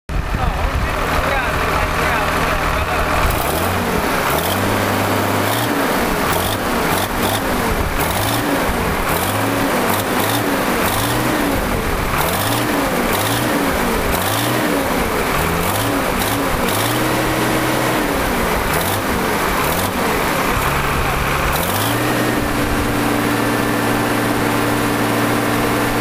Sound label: Tractor Claas, Heavy cars